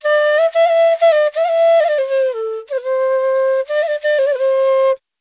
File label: ETHNO EXTRA 90; ethno2